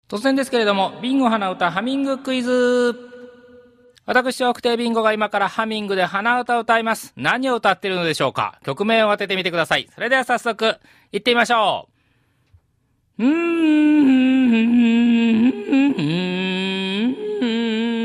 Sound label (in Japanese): hanauta